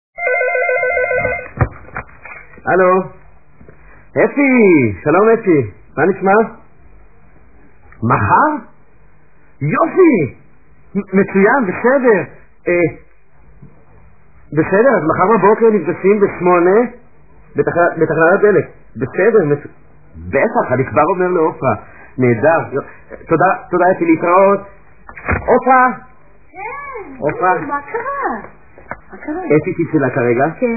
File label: duduphone